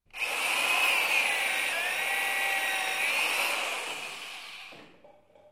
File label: hammer drill